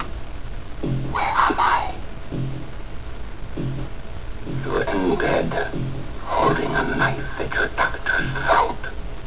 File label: KNIFE